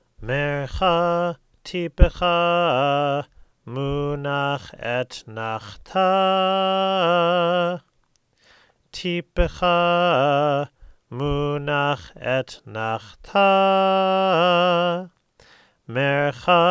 Torah Trope Etnachta

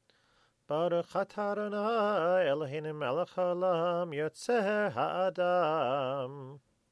wedding blessing

